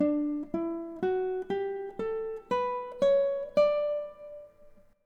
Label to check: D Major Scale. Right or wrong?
Right